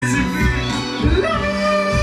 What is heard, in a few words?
laaaaaaa